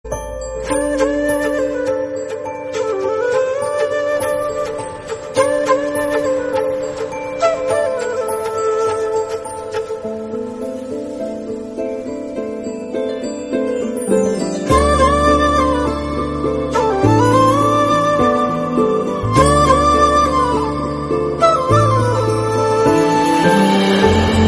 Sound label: romantic tone; guppy